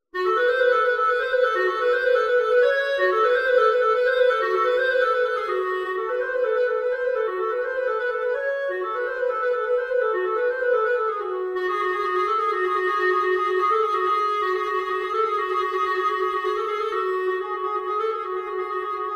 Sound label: Blues, Clarinet